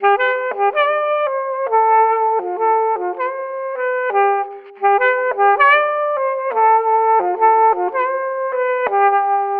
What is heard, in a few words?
sax lead; looperman